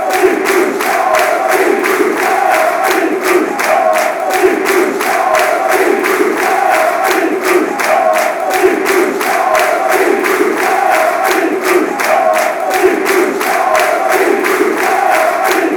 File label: sunderland chant